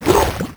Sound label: tarmonster hit; Crypt of the NecroDancer